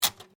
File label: ADDING MACHINE SIEMANS ELECTRIC 1950S SINGLE KEY, Electric adding machine; Typing Sound Effects; Typing (Sound Effects:Offices)